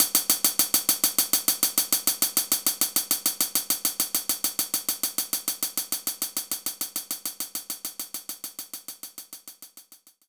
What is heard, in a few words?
Hi Hat Closed Soft